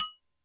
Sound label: Hi Muted, Alesis D4 drum machine